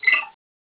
aim; cso; x-15

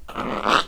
zombies misc idle

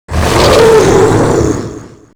golem attack

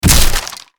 damage

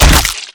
rifle swing hit infected, melee; weapons

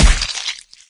pain right arm no vo; doom3

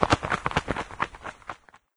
flutter, cherub; doom3

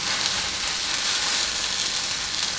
welder loop; tools

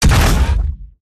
footstep; gow; silverback

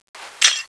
clip out; tfa cso; starchaserar

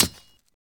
hit other, combat knife; weapons; props dane

